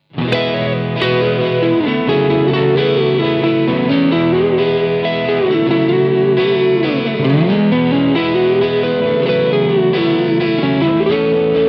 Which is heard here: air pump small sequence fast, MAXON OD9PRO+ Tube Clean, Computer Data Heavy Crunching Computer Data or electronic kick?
MAXON OD9PRO+ Tube Clean